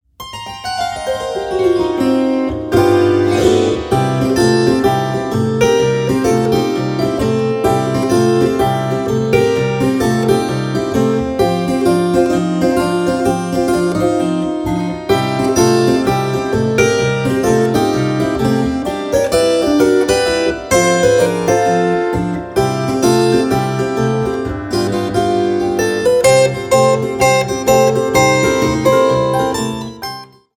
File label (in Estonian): kannel